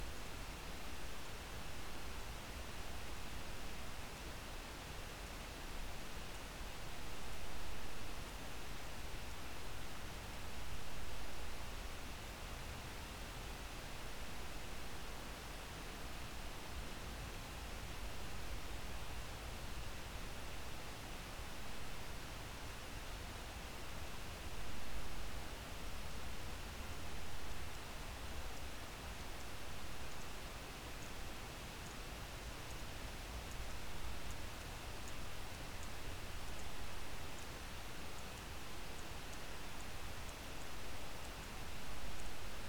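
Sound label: hydrophone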